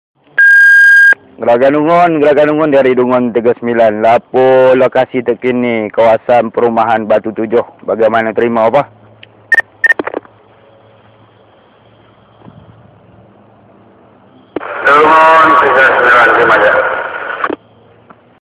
Polis Walker Talkey

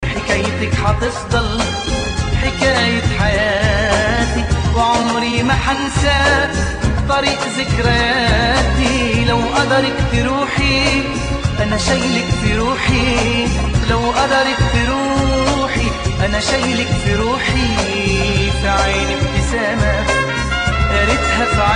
Arab Sadness

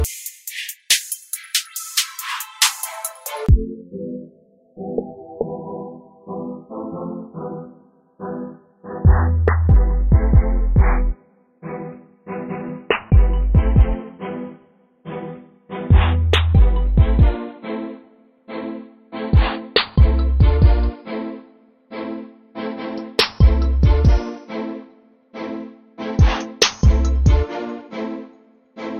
Trap Beat

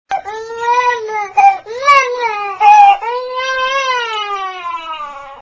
Baby Cry Msg

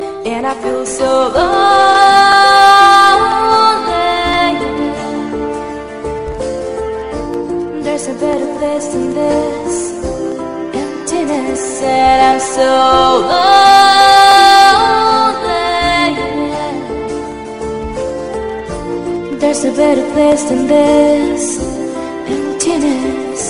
Emptiness Female

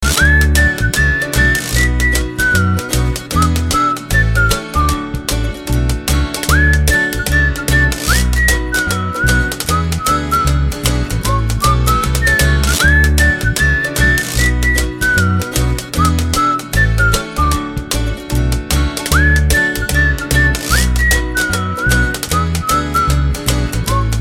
Whistle n Jazzy

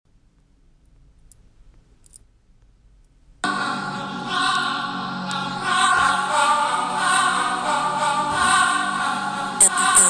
Danza kizomba

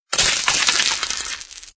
Coins Scattered

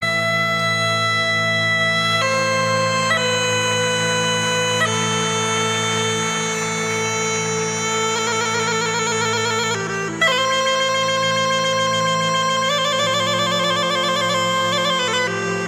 Ethno Ritmica

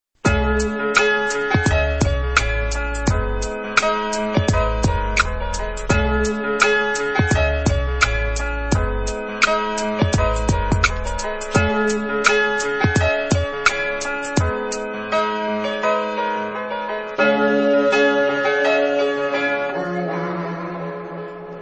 Atmosphere

